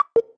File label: Button; MilkChoco